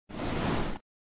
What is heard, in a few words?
fist; attacks